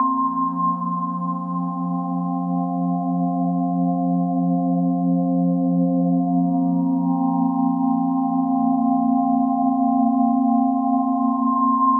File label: SPHERE, D+B, 160, C; eJay